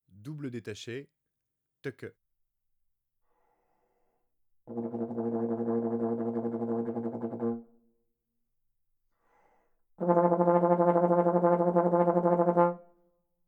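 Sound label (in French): double-détaché tk, trombone; Textures; Sons granules sonores; traite orchestration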